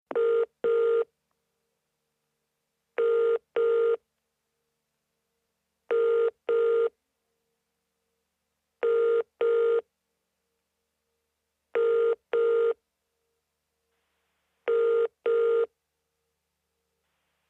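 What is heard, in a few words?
British Modern Ring, Foreign Futz Touch Tone Rings; British telephone, modern ringing tone ( 1990 )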